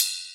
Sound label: EDM Future Ride, Cymbals; Big EDM; Future House Tools